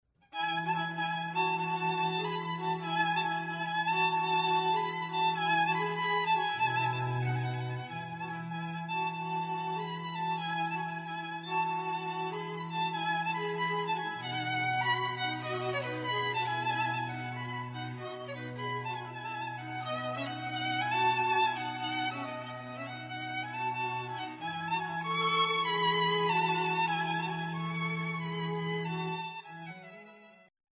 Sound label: Violin, Viola Cello; Vivaldi, Danza Pastorale, The Four Seasons